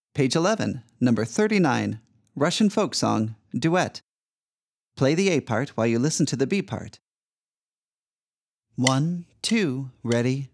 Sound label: Russian folk song duet